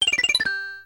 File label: Sound effect of Map Menu; SMW